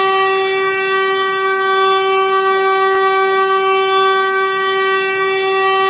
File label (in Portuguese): Buzina Volvo